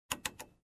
Aircraft Seat table unlocked

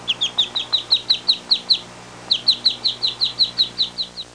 Baby duck chirping

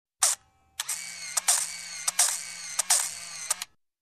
Taking pictures in series

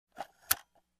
Digital Camera Shutter Sound

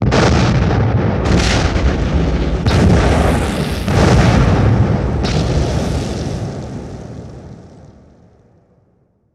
Successive Explosions

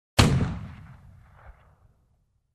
Single shotgun shot

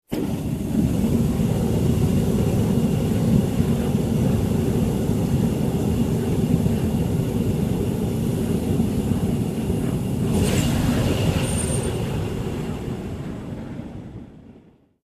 Missile launch

